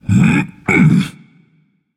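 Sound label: non-verbal grunt lift; smite; atlas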